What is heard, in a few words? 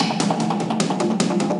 Desert Percussion; G.I. Joe The Rise of Cobra; NDS